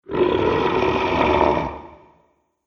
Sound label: Dragon Old One, monster; Monster Sound Effects; horror sound effects